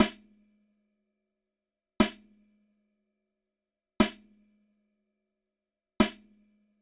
snare dual mono 3ms delay, Dual Mono with right delayed 3 ms; Haas effect